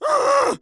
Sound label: Horror Scary Human Male Scream Groan Scream